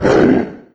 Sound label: mancubus pain; doom3